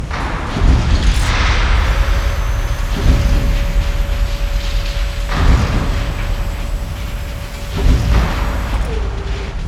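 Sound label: outdoor loop; Garry's Mod; tf2; cp harbor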